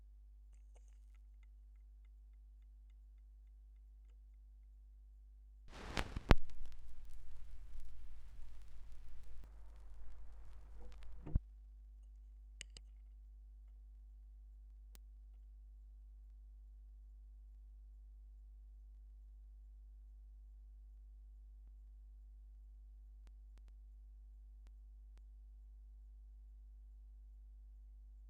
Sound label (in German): schallplattengeraeusche; rauschpartikel